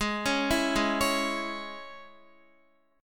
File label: Ab Suspended 4th Sharp 5th Guitar, chord; Absus4#5 arpeggiated; JGuitar